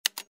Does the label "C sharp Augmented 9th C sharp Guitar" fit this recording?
No